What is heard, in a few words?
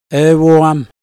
groan